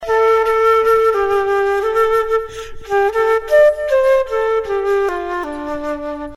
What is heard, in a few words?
Jazz Flute Phrase